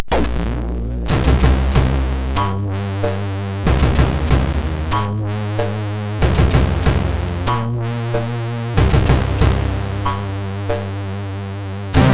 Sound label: sid MOS6581R2